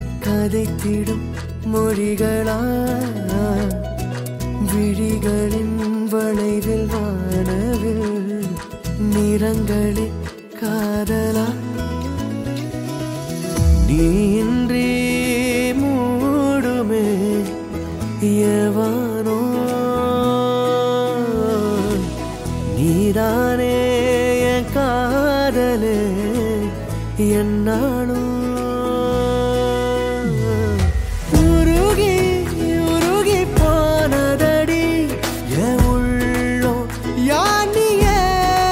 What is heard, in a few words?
Ringtone